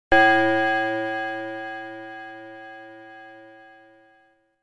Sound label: Grandfather's Clock